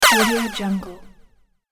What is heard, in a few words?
zappy laser echoes